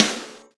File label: Stereo Processed Snare Drum